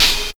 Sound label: soft sounding hi hat sample b key; hat samples